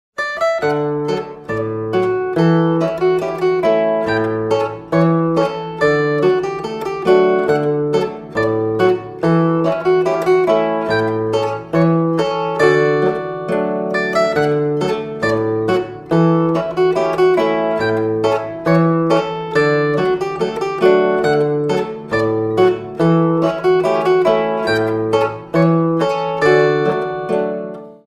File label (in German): Polka